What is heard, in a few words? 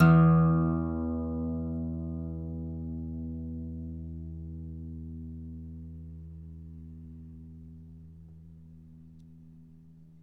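Acoustic Guitar Strum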